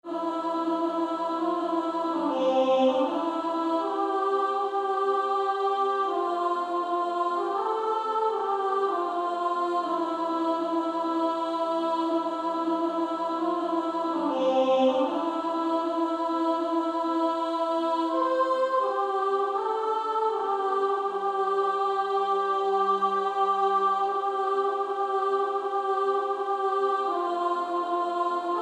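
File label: Female Choir, soprano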